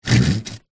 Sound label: household chair wooden large heavy 1970s move drag scrape hard ground